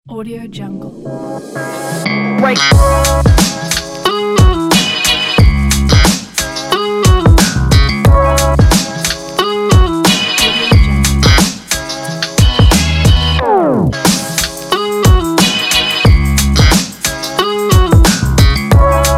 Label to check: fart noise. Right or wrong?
Wrong